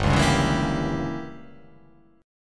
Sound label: G Diminished 7th